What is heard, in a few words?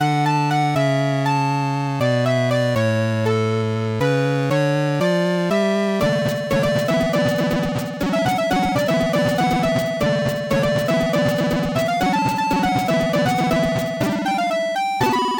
Commodore 64; MLP